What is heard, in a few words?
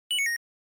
NES Fighting Score